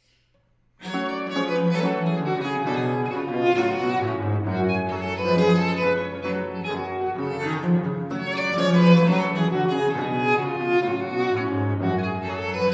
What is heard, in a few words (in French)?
concerto no 1 en sol majeur, gavotte; francois couperin; concerts royaux